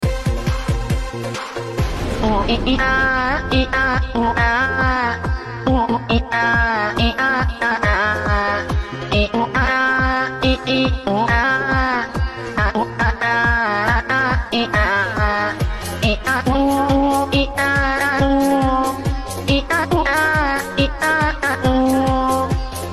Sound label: Lorestani Remix